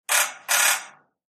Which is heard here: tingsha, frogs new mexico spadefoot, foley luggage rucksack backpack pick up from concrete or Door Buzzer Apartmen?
Door Buzzer Apartmen